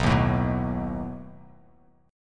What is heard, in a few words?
B 7th B down